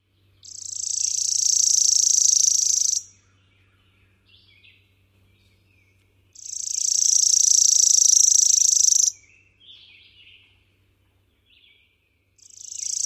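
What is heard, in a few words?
songs, Eastern Wood-Pewee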